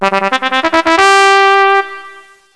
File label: trumpet; PC Gamer IT CD 48